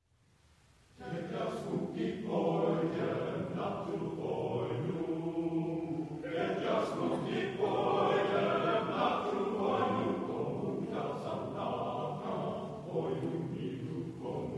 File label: Slovak folksong, glee club